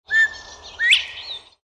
bobwhite quail sound

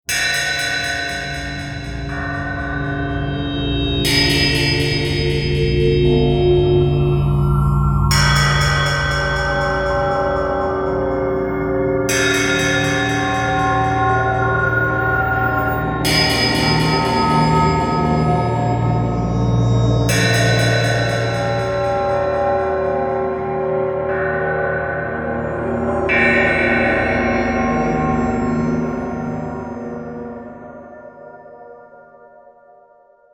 halloween scary ambient ringtone

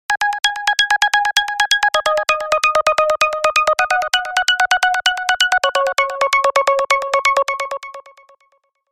basic tone ringtone

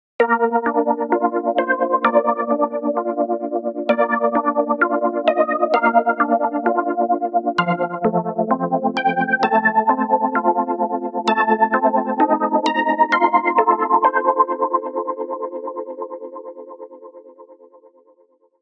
soft tremolo synth ringtone

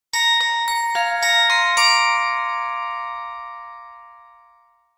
bell notification

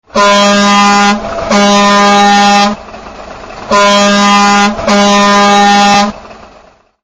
truck horn sound

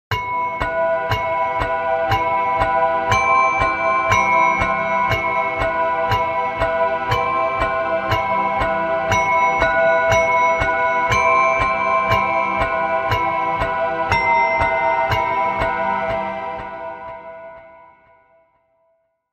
spooky mobile ringtone

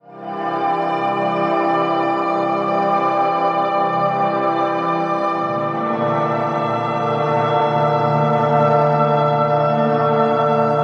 peaceful soul

